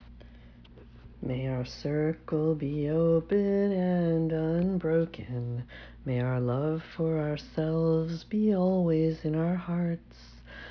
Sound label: The Circle is Open and Unbroken Sung; chants